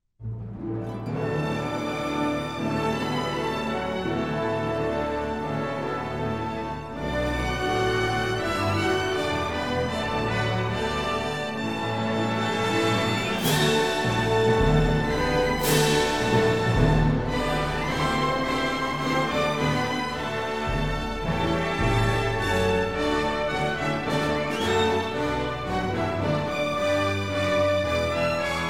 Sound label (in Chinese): 豪迈的背景音乐